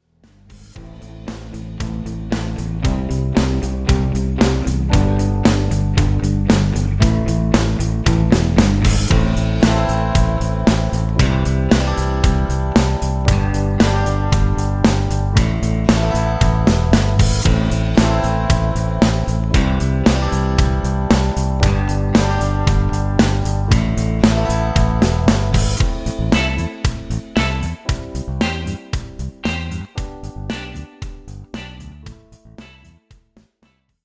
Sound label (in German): Instrumentalparts; Hoerraetsel